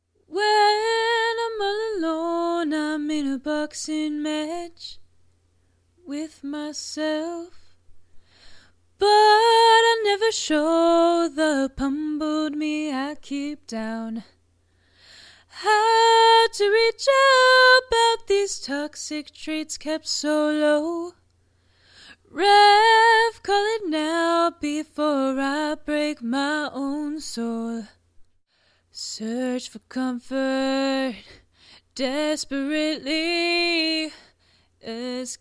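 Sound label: Pop, 90, A minor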